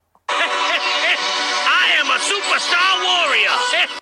HEHEHE

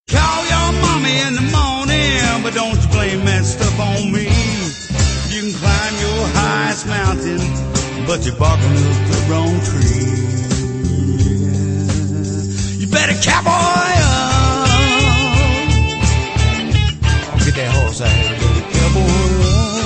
Country

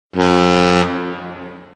Horn

